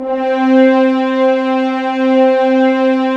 Horns; Roland - LA Composer vol. 4; HZ Horns

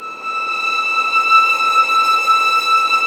STR VLS, Roland String Master Series, Vlns 6 p-mf

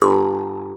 MT G, JHARP G B; Xsample VOL-9

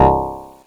Drums, Hits; DCM8

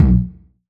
909 Tom amp; Goldbaby Tape808 & Tape909; The Tape 909

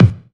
BD, BASS DRUMS; EdgeSounds

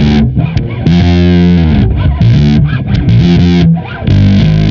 Bass, Distorted; Bass X

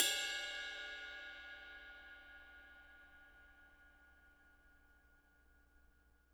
RIDE, RIDE CYMBALS; Spectrasonics - Backbeat

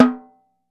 CLEAR, X-STICKS; Sampleheads; New York City Drumworks VOL-1; KD X-STICKS

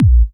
Deep 909, Kick Drums; Zero-G; Soundclash

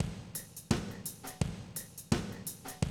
Classic DrumLoops

